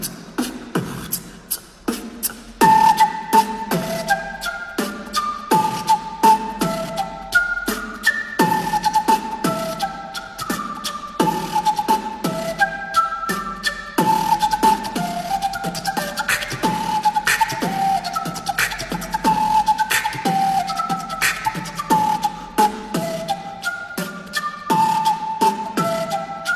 Indian Flute Ringtone

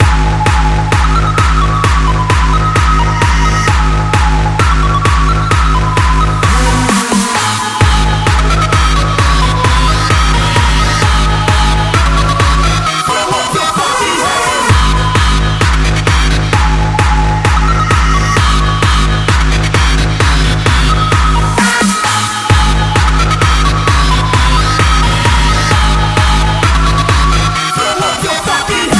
Mi Electronic Ringtone

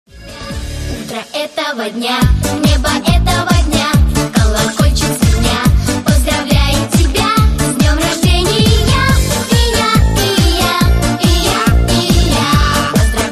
English Song Ringtone

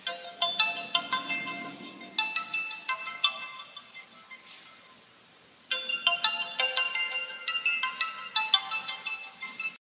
Oppo Pad Ringtone

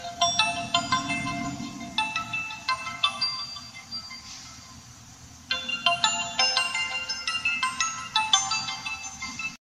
Oppo A96 Ringtone

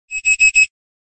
Sms Ringtone; Huawei Enjoy 20e

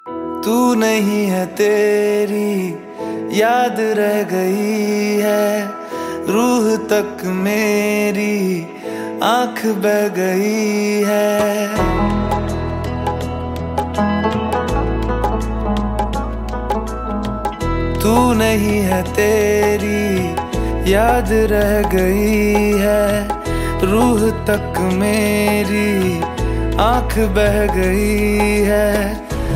New Hindi Love Song Ringtone

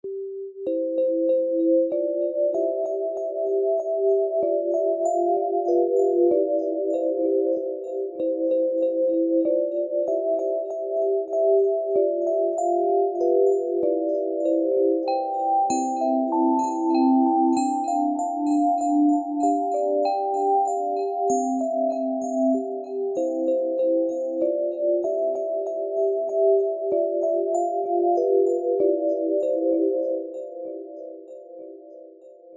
O Christmas Tree Ringtone

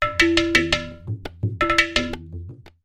Tabla Ghatam Pot

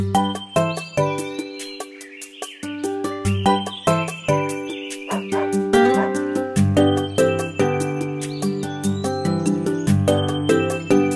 LG X4+ Original Ringtone

